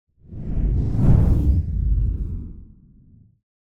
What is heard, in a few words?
Whoosh Soft Explosion High Sub Energy; Hollywood Edge; sound designer's toolkit v2